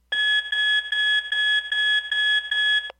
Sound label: bedwetting alarm sound; night guard